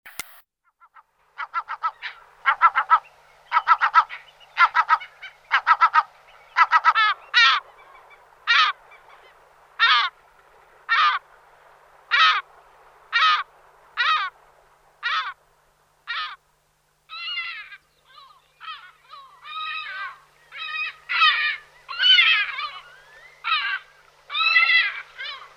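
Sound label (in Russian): klusha golos, lesser black-backed gull voice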